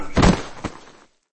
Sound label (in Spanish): caida